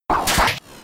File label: Tail Slap; attack moves; Pokemon; Gen 5; Blk Wht Blk2 Wht2